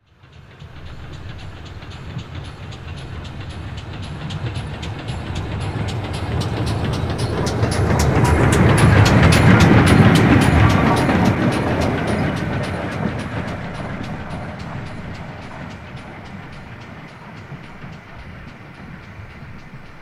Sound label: By Slow Steam Train Pa